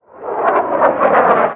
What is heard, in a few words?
explosion artillery far